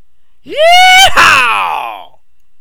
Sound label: yeehah